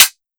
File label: JUMP UP HATS